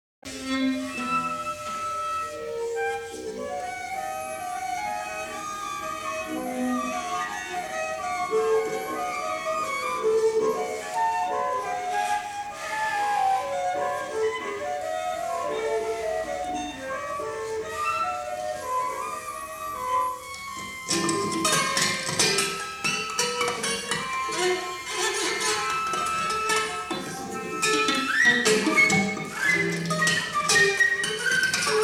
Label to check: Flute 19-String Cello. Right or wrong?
Right